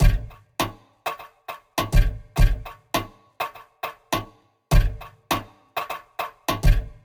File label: Zither Percussion Groove 102 bpm; MIDI Clips; Drums; Ableton; Factory Packs; Lost and Found